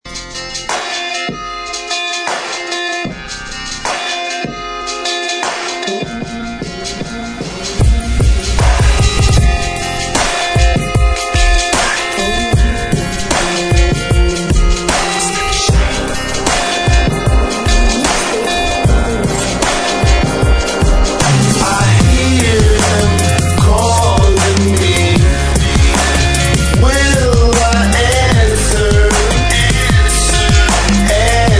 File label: dubstep remix